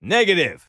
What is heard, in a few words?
negative, Voices Emotes